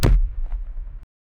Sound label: soccer ball kick